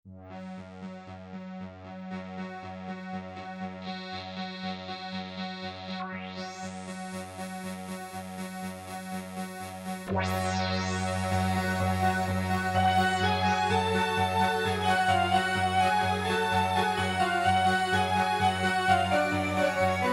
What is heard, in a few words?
siel cruise poly